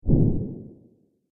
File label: Dinosaur Mighty Footstep